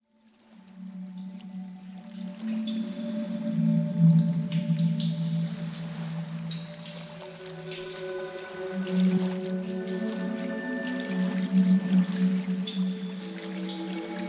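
zvuki prirodyi dlya malyishey spokoynyiy tolko so zvukom morya, nature sounds for babies calm only with the sound of the sea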